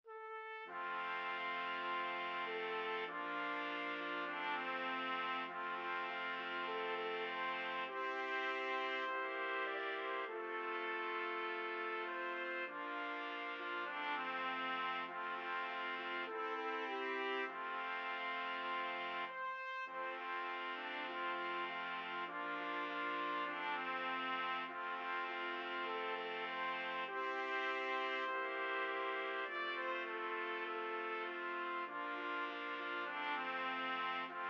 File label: swing low 4TPT, trumpet quartet